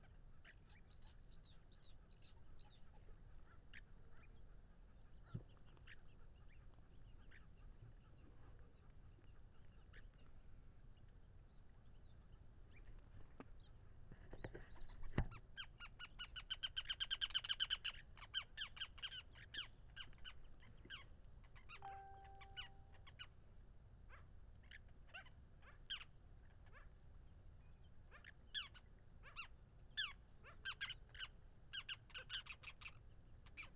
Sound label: Ruddy Turnstone Arenaria interpres